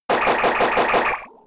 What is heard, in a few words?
Bubble Beam; Pokémon SFX Gen 4 Attack Moves; DPPL HG SS